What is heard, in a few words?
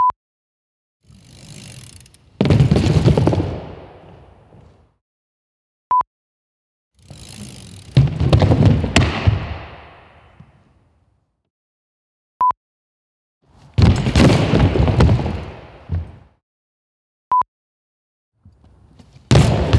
Indoor Bails Bike Crashes